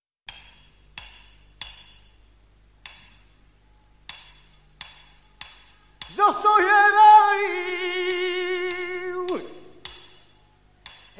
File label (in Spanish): Tona, flamenco